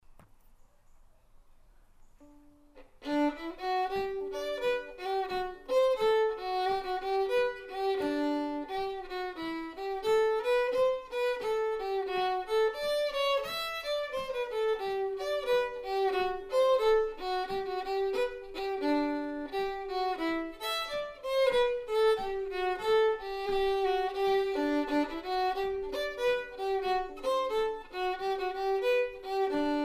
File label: Hornpipe, fiddle; Wonder Hornpipe